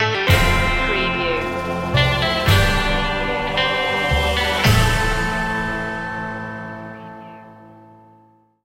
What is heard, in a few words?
western bravery stinger